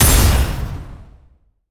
Mech Griffin explo; monster

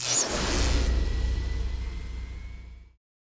trap wall death, monster

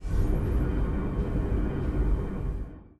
mship boss idle, monster

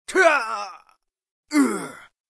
death

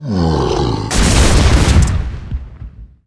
mech buffalo dead, monster

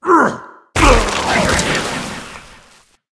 snow santa dead, monster

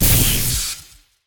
mech scorpion explo; monster

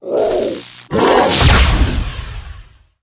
mech ape archer attack, monster

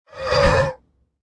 bugmander attack, monster

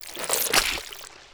spider

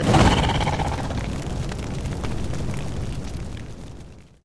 zombie dead, monster

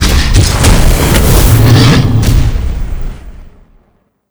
deth; sekhmet

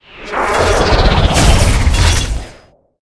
attack

